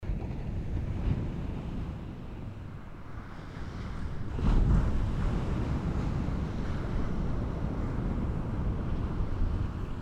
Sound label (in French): bcl mer ti pont Jecklin vent d terre; L'iliade Philippe Brunet